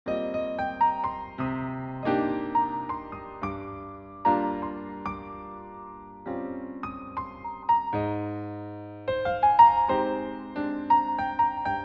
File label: Solo Piano; Jazz